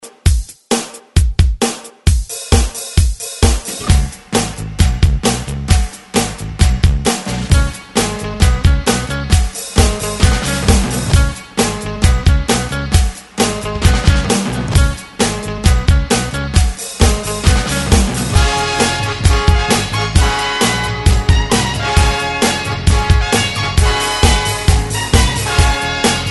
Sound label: Re Drum 132 Bpm; DJDOLLS